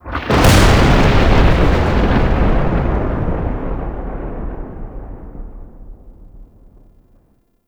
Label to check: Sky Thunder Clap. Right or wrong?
Right